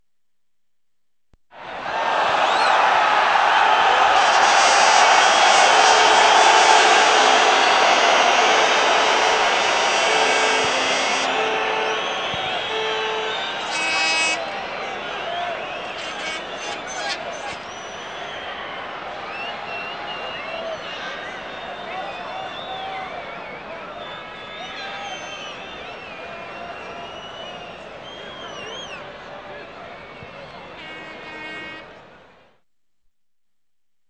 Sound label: 3 cheers; World of Football 1998